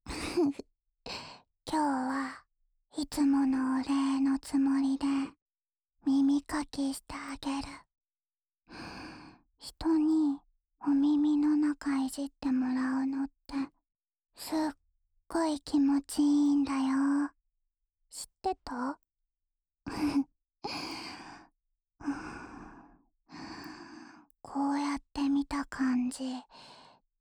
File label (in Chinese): 安眠用掏耳循環（右耳）, ASMR